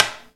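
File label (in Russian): процесс отбивания фарша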